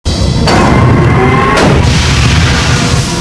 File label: gate dial